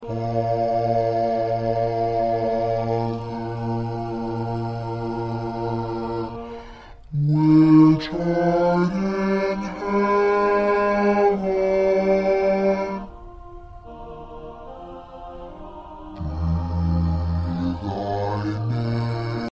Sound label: Bass